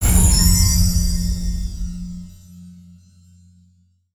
Level Up; Earth and Beyond; EA Games

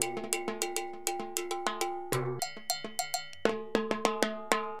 AcidJazz Funk Perc; LiveAct Pool

